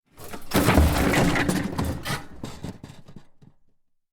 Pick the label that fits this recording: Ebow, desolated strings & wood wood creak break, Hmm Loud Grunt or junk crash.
junk crash